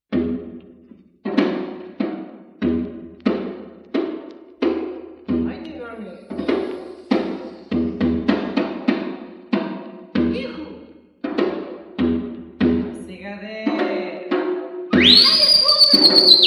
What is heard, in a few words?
chopska petorka, radio skopjie folk orchestra, skopje macedonia